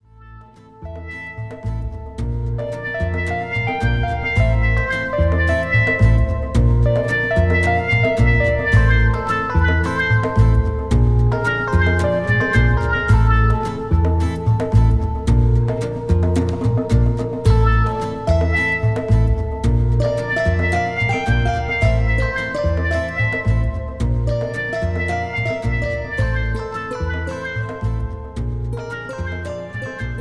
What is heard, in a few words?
clean wah